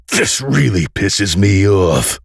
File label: Pain; Duke Nukem; Forever 2001